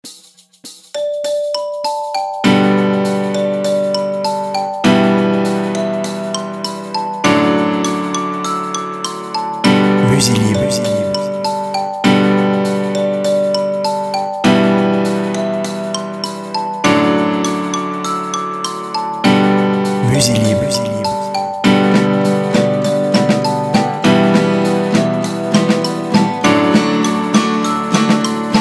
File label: jingle bells